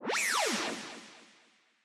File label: Menu SFX Level Transition In; BopIt MenuSFX LevelTransition In